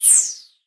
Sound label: garbage in small; tetrio